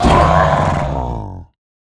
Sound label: ravager death; Minecraft